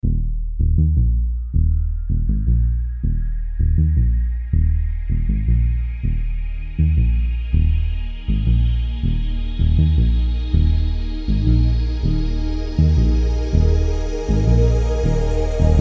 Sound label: moog bass